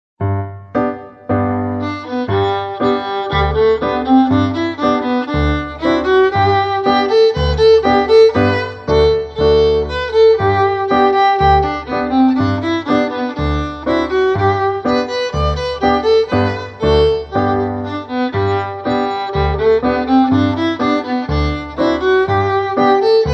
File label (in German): irisches fiddle lied